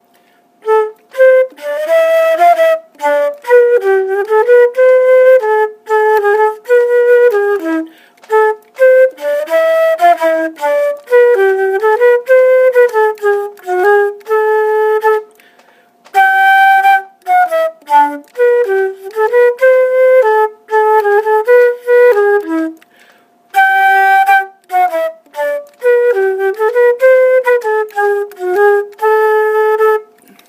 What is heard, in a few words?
flute